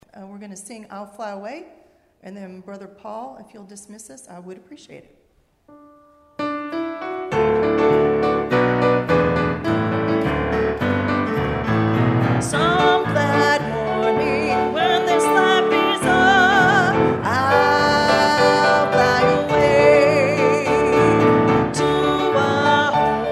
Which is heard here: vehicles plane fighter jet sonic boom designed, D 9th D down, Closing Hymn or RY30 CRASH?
Closing Hymn